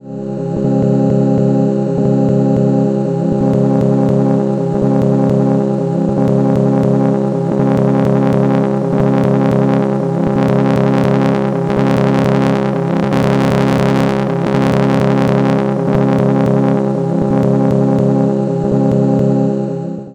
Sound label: Distortion via PM